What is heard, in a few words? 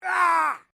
MALE SCREAM MID